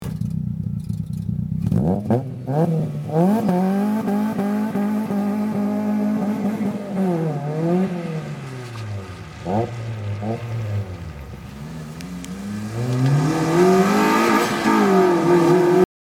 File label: Opel Corsa B GSi